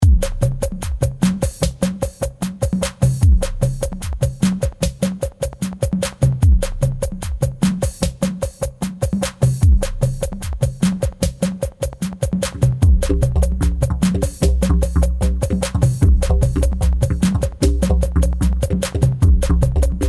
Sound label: Day of the Didge; Noisebiscuits